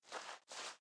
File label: steps sand; Rapid Gun; ipsc